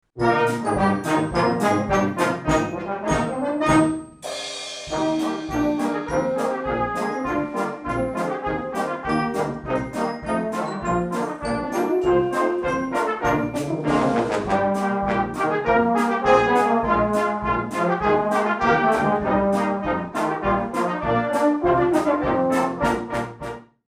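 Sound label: Marsch, Brass Band; Kleine Schlussel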